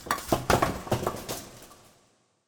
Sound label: bowling pin